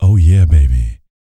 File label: Vocal Planet R&B Dance BASS CATCH; Spectrasonics Vocal Planet CD4